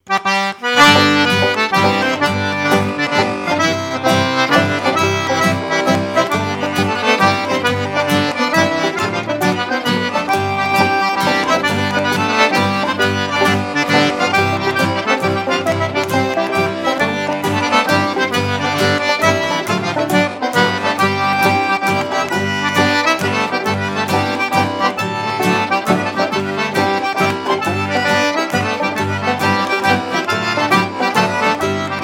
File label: Love Bridge Waltz